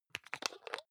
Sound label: hygiene small screw cup open medium; haus-garten; badezimmer